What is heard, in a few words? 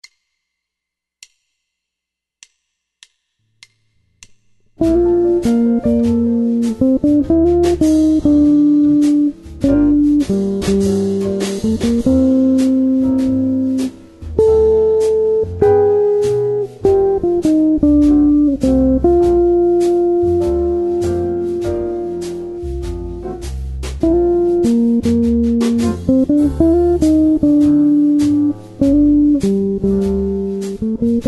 chord melody guitar